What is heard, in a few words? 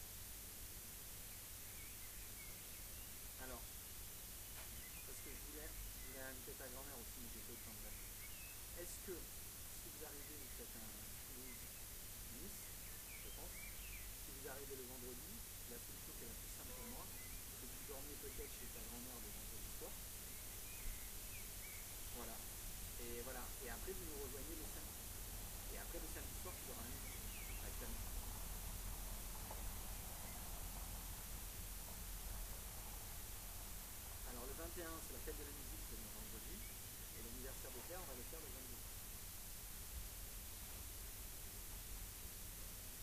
rayol farm; SEAMED